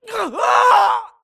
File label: grunt death crush; Titanfall 2